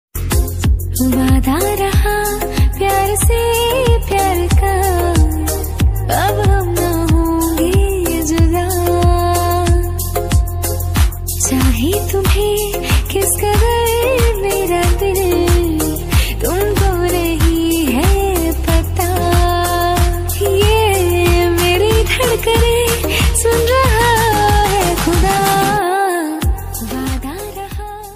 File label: female hindi ringtone